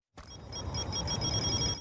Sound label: BUILD UP; fast build up